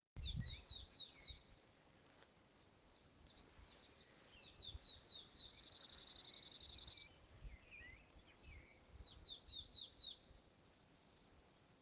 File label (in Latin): Poecile palustris; SETE